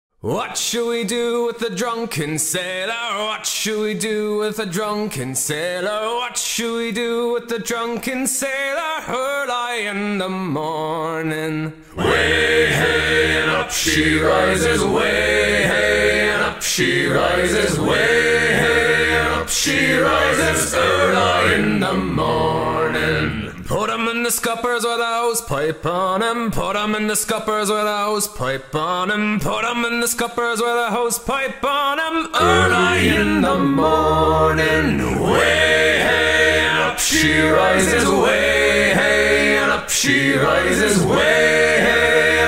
Drunken Sailor Sea Shanty Cover

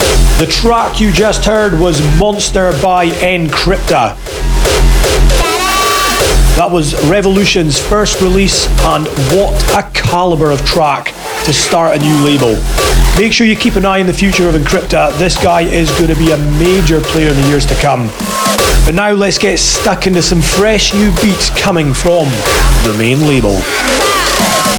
Vocals

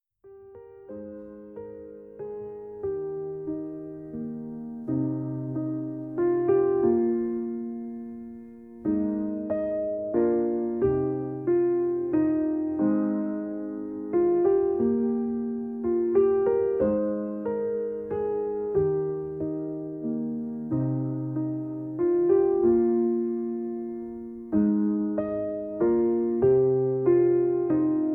Piano Solo

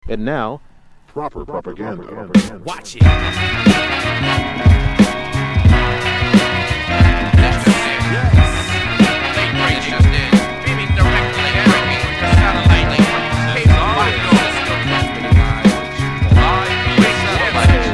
R B Hip-Hop Break dance

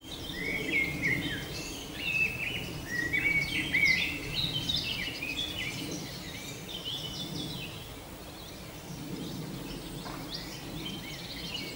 Relaxing Natural Environment Ambience

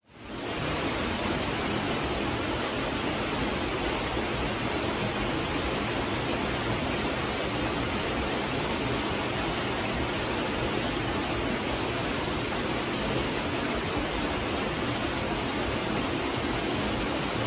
Waterfall Forest Ambience

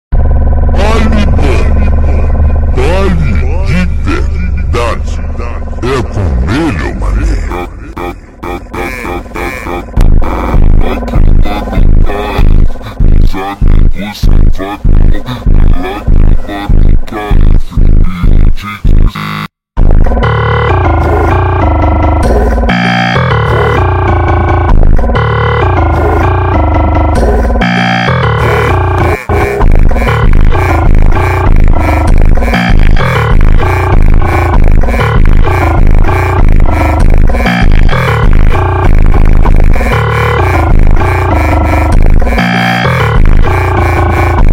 Slowed

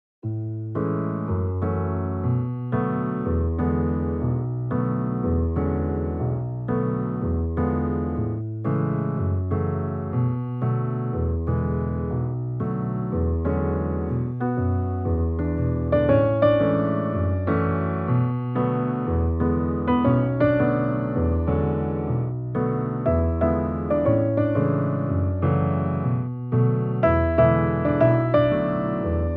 Little Magic Piano; Musica Relajante Para Estudiar; Dreamy Atmosphere

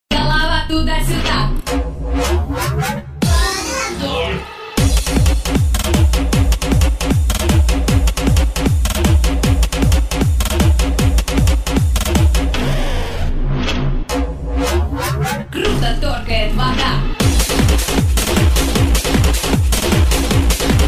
Hard Bass

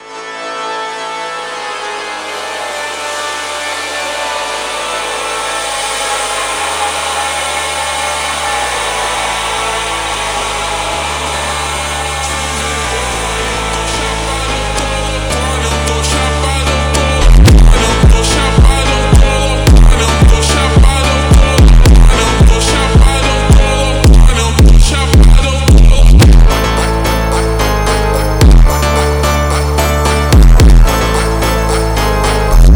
Slowed Reverb

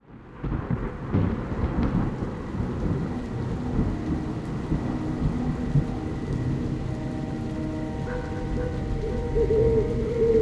Thunderstorm

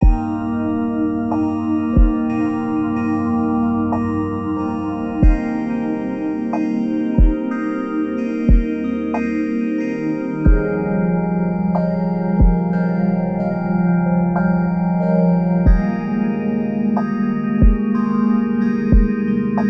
Binaural Beats

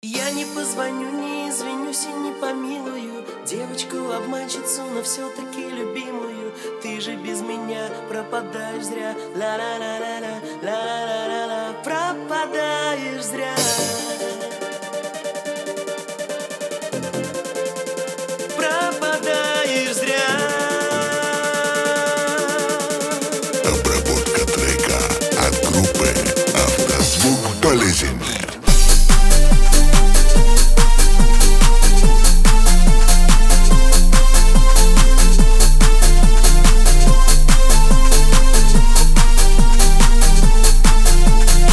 26-28-29hz Low bass

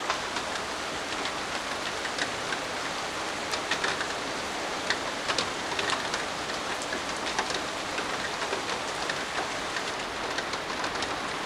Tibetan Singing Bowls

